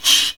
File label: ETHNIC PERC; Roland LA